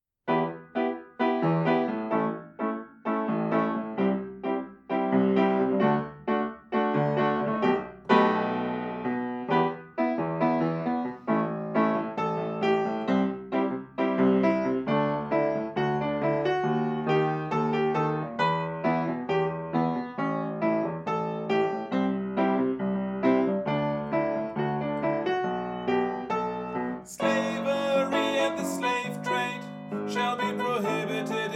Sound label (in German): Klavier; sing human rights